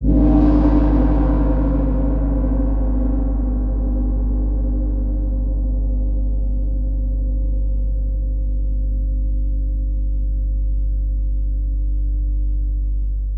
great gong, ringtones; dustinwells

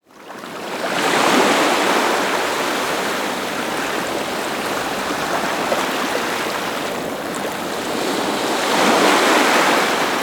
ocean, ringtones; dustinwells

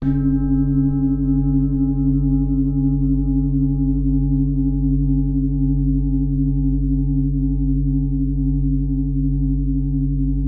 low gong, ringtones; dustinwells

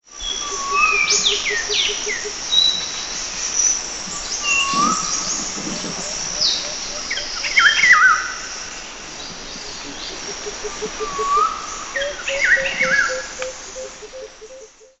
jungle, ringtones; dustinwells

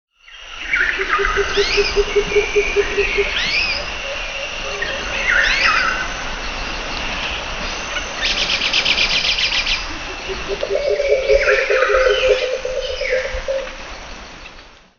forest, ringtones; dustinwells

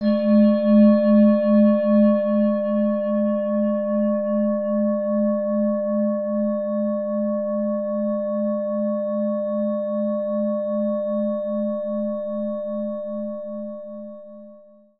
singing bowl, ringtones; dustinwells

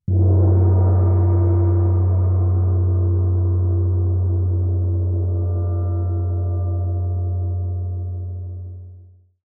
soft gong, ringtones; dustinwells

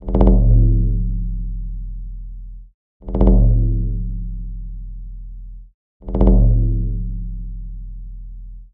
shaman drum, ringtones; dustinwells

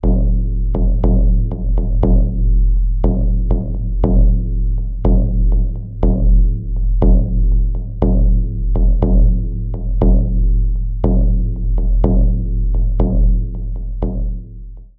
tribal drum, ringtones; dustinwells

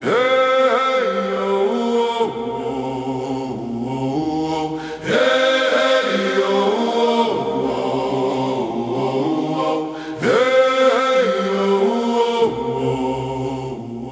shaman voice, ringtones

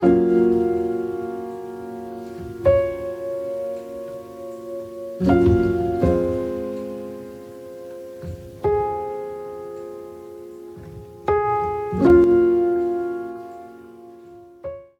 quiet piano, ringtones; dustinwells

